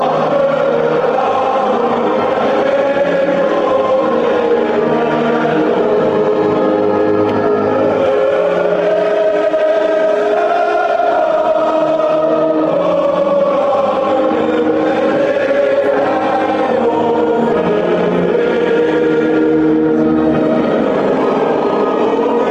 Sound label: dutch national anthem, fanchants